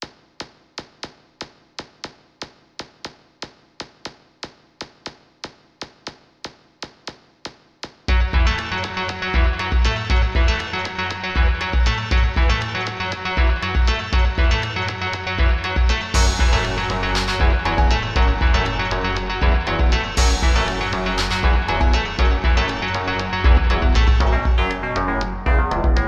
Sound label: techno